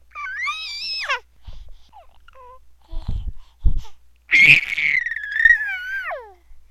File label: gurglesqueal, A Half-Year Old